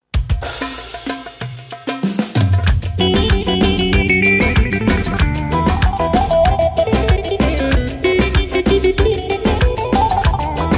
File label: Turkish Electro Guitar